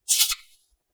squeeshy short; CinemaSpice; Mograph Mini Transitions

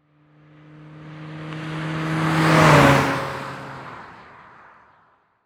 Cars Honda Civic Drive By Very Fast; transportation

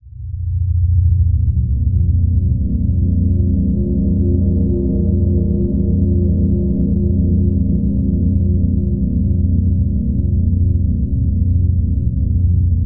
Drone Bed Pulsing Slow Bass; Production Element Imaging; AdobeCC

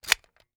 Foley Toy Dart Gun Cocking

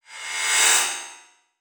Multimedia Internet CD-Rom Flash Wipe

